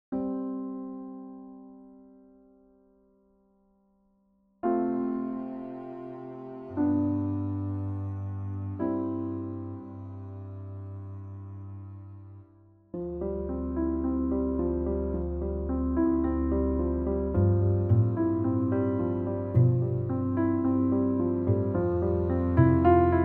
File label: piano softer; Music for the end of a series of short films. Gently moving piano + lovely double bass!